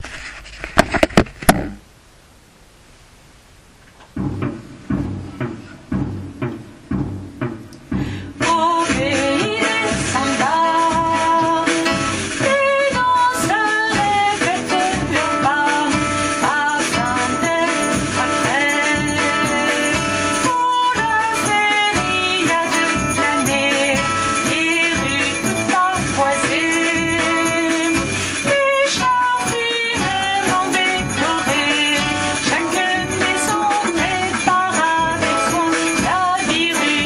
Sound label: samba